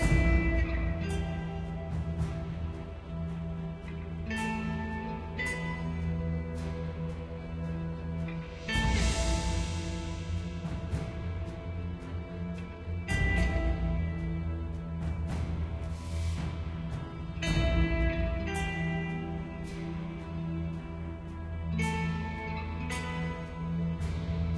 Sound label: Med Loop; Macau Fort